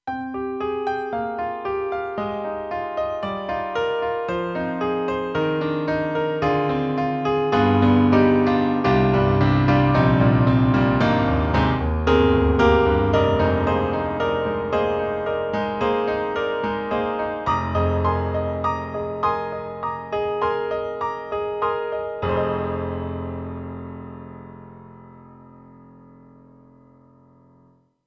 KORG M3 XPanded Stereo Grand 3-Way

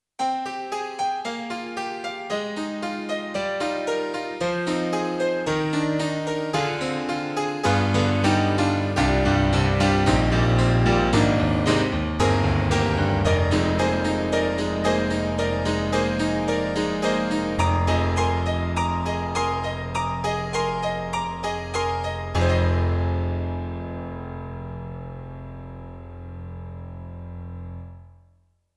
Alesis NanoPiano, Acoustic Piano; Titanium88

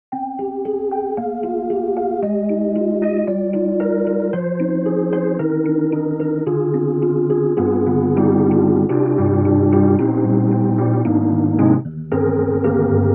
Psychedellic Rhodes; EastWest; Quantum Leap Goliath

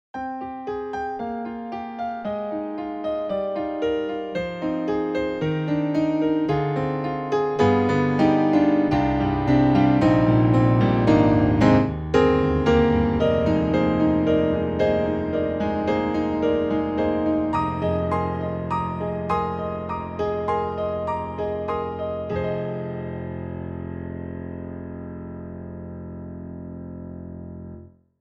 YAMAHA MOTIF-RACK XS Full Concert Grand

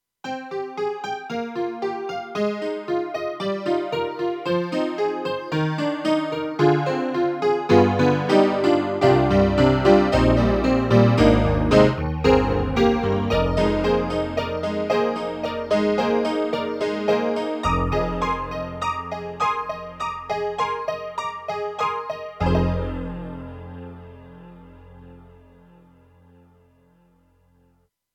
CASIO GZ-70SP HONKY-TONK

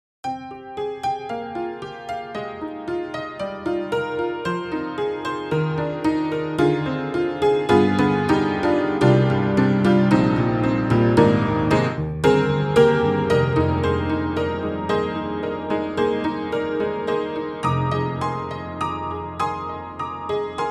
Creaky Ol' Barroom Upright; Upright Pianos; Synthogy; Ivory II

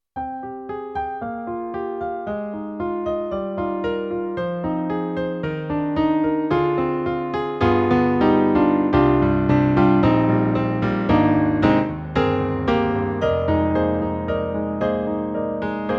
Stereo Piano; Roland; SRX-07; XV-5050

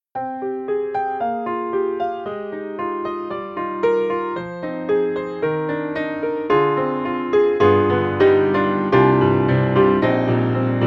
PIANO; PREMIER SOUND FACTORY; PIANO Premier V1.8

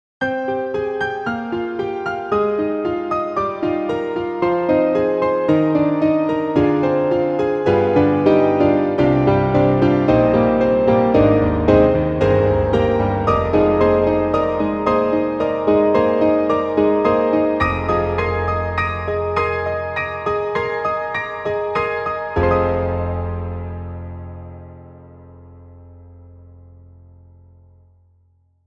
Grand Piano Octave; reFX; Nexus2

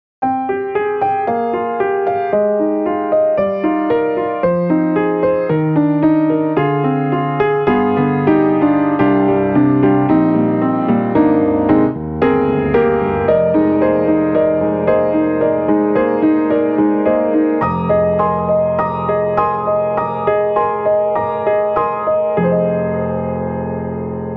Modern Upright, Monos To Stereo; XLN Audio; Addictive Keys

